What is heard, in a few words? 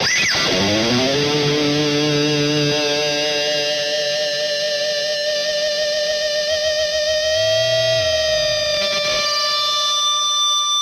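Electric Guitar Going Feedback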